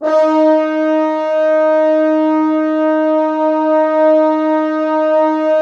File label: Orchestral Brass